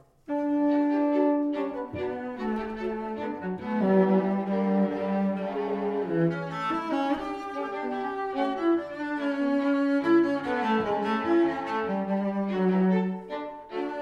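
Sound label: string quartet D major; asplmayer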